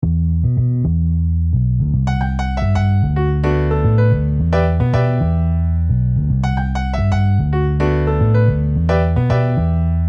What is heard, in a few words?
Piano Bass MIDI